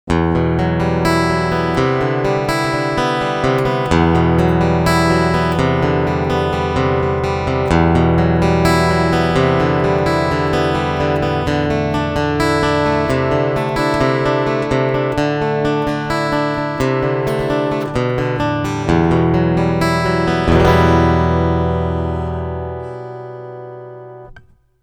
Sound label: Picking Flat EQ